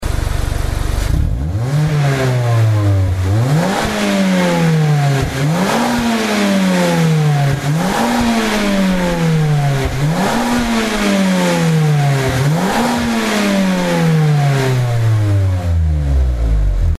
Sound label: Mazda MX5, Muffler; AutoExe